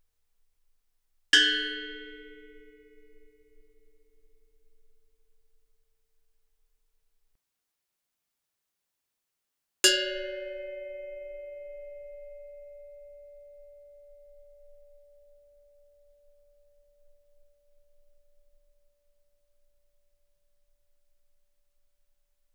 Gamelan; Propeller Island - Complete Gamelan

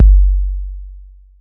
BD 808

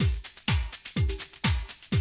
125, Classic Drum Loops; Best Service; XXL 1500 Classic DrumLoops; Sample CDs

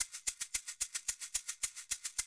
110, Classic Drum Loops

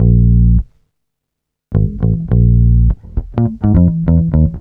BASS, BASS GTR

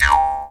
D JAW, Primitive Instruments; Big Fish Audio - Didgeridoo and Other Primitive Instruments; JAW SIMPLE

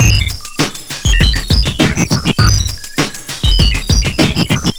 r2d2 groove, 100bpm

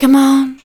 FEMALE, Dance Vocals

